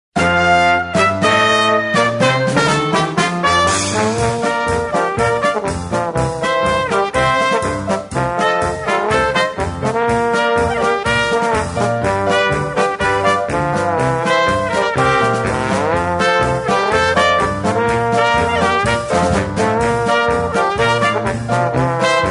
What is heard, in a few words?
two beat